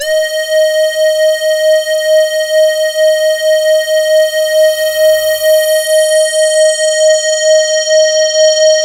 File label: LUCKY SWP, SYNTH; Keith Emerson Signature Series